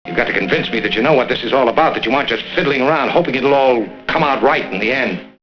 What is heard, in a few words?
fiddle